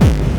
Bassdrums Hard Kicks; Vengeance Samples

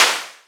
Snares & Claps; Vengeance Samples

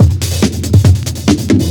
Breakbeat; Vengeance Samples; VEC2 Loops

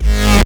Synths C; Vengeance Samples

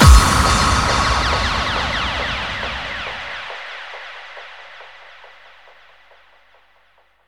bassdrum reverb FX kick; Vengeance Samples

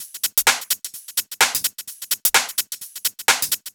Drumloops 128BPM

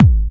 Hard Kick; Vengeance Samples

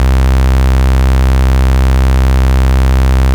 Basses C; Vengeance Samples

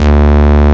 Bass D, Root D

